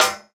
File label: Snare, jungle breaks drum sounds